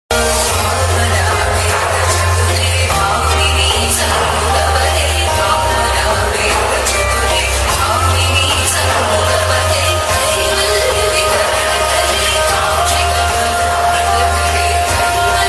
song ringtone love ringtone malayalam ringtone psycho bgm